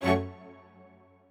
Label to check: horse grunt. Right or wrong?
Wrong